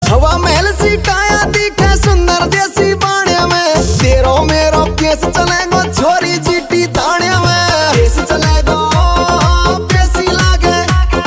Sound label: Song, ringtone